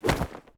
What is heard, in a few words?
grab